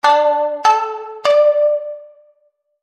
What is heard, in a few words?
siamisen; AJ Hipkins